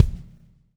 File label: SLICK, Backbeat